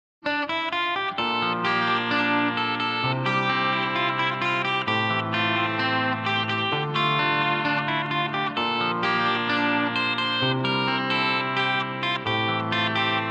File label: guitar Free Amp Clean